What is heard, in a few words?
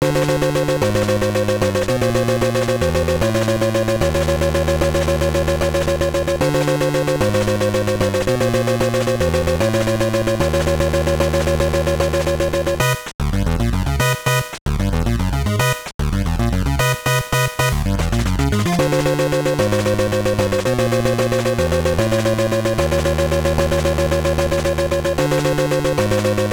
Boss Fight; Captain America and the Avengers; NES